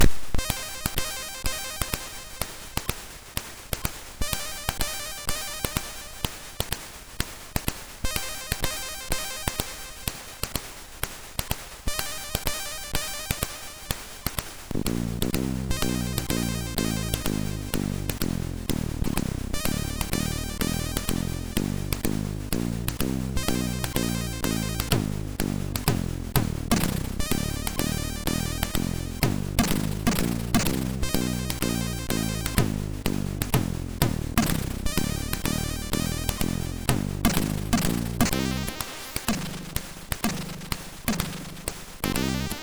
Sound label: Juba Jazz, MOS6581R2